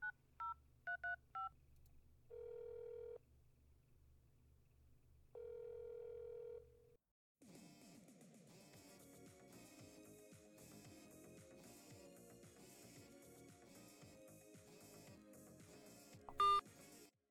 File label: Ringtone